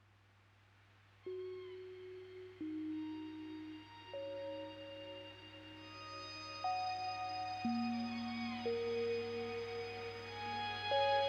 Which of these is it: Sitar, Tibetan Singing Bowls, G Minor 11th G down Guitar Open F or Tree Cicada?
Tibetan Singing Bowls